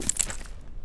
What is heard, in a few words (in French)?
Verre Seul